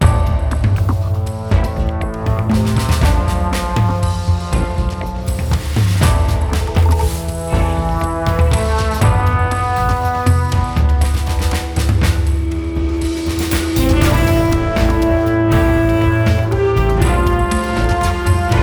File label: Travel Orch; Crysis